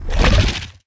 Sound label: reload; valorant; rgx 11z pro vandal